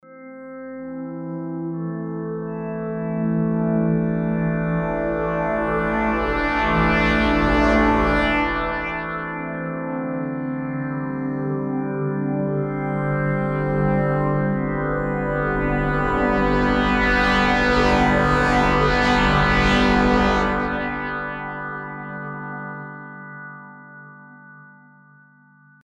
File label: Liquified MPE; Philippe Loiseau